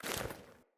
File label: Foley Run Default, Default Clothing; Player